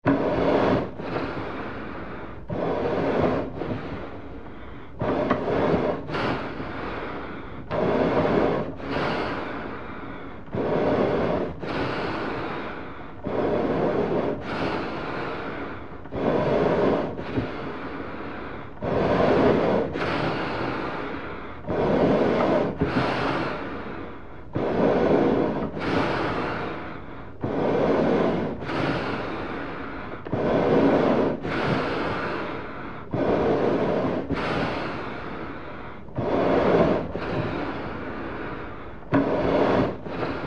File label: Animal Breath; Eerie, Close Perspective. Use For Monster.; Animal Vehicle Growls